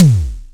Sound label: BDRUM, Simmons SDS5